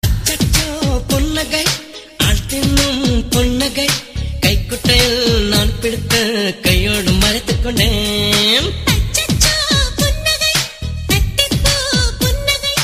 Tamil Song

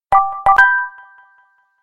Charming Bell; Insta

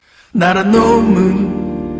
Korean Text

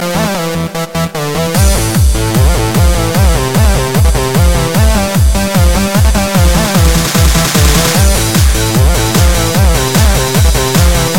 Element Of Trance

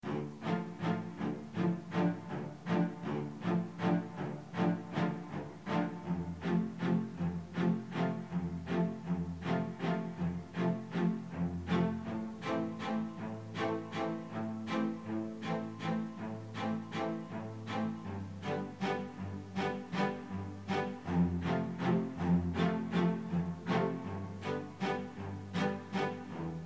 Orchestral

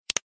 Click

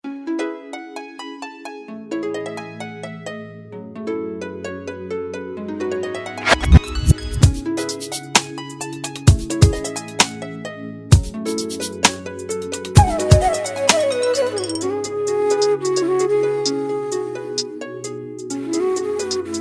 Soft Melodies Tone

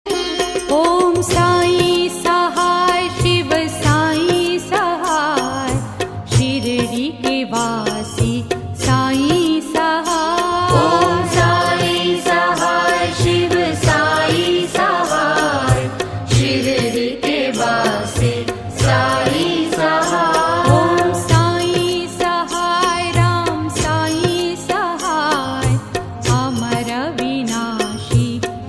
Om sai

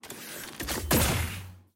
Fire

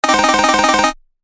Frantic Notification

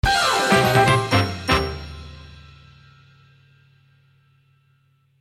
Win; Paper Mario CP

